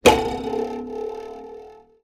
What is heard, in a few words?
cartoon twang wood stick between guitar strings; zapsplat